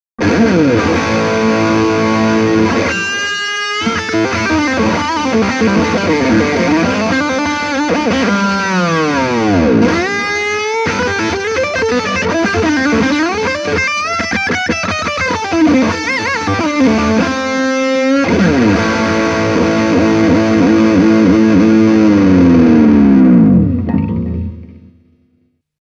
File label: metal stack